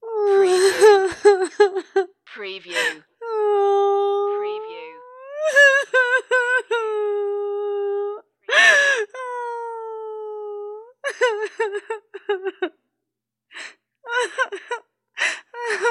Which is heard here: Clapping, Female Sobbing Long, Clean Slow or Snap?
Female Sobbing Long